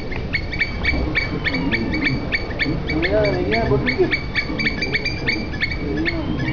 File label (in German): palmenhoernchen; srilanka; saeugetiere